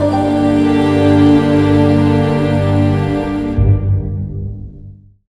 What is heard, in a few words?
Orchestral Mood; Zero-G Jungle Warfare 1, 2 & 3; Strings & Things